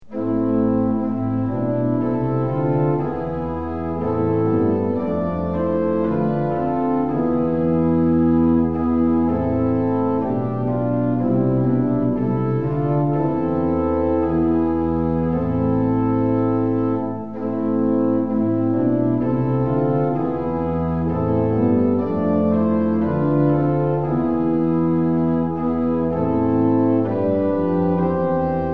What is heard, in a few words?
tune; hymns; lillenas